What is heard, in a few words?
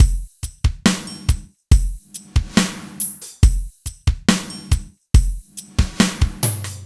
Slomo Beat 140 BPM, Loops, Slow Motion Beats